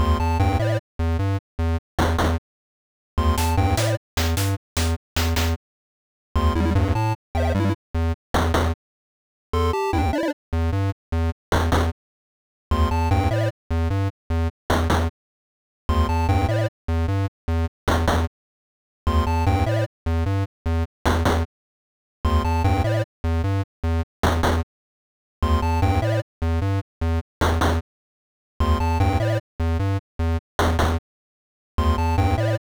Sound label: 8bit test